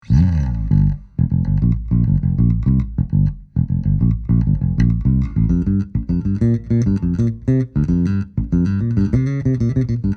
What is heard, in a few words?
bass only